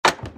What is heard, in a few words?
detonate; tntbomb